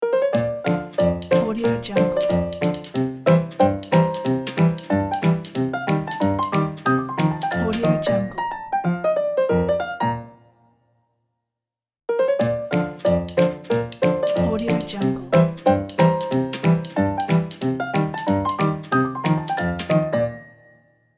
ragtime piano logo

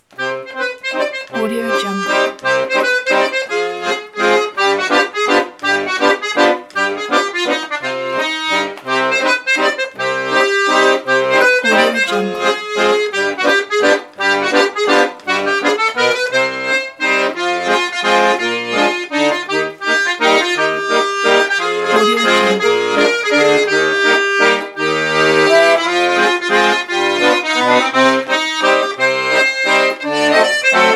french java accordion; remstunes

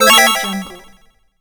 fail; wildemotion

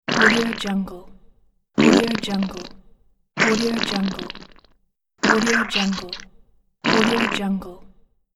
big cartoon splat; big cartoon splat pack 1

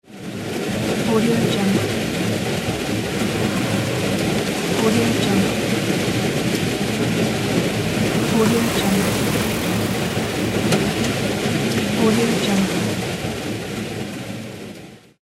rain on car

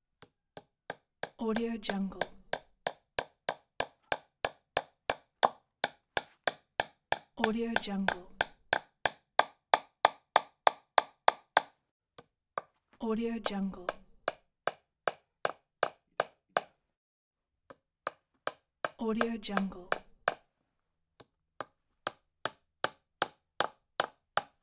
hammer hit wood

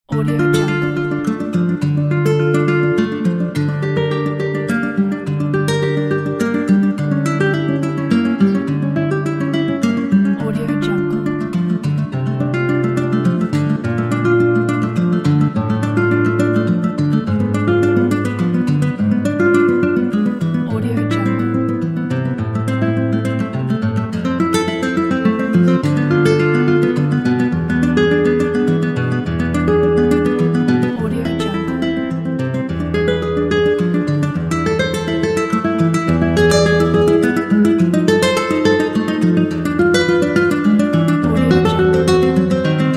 lute prelude

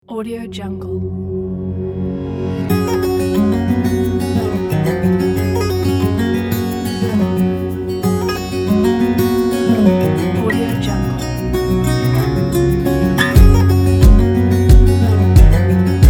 nature indie folk

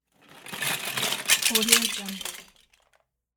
plastic toys falling hitting floor

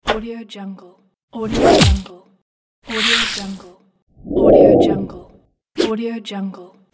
swooshes; 3dhome

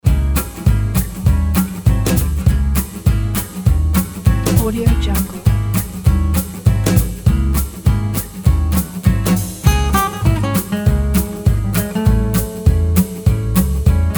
gypsy swing story

